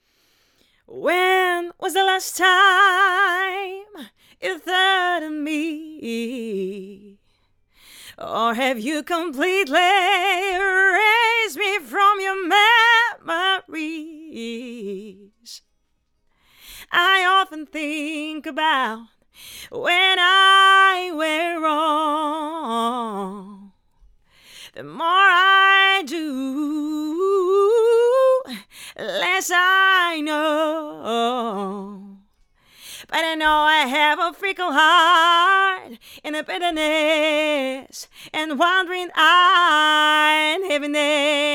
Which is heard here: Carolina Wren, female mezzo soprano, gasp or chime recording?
female mezzo soprano